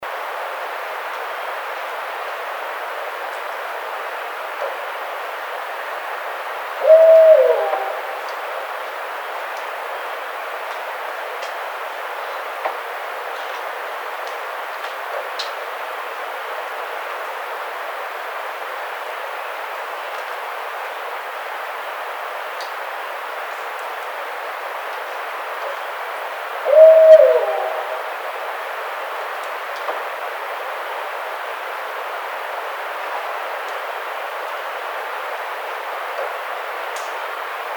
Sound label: Barred Owl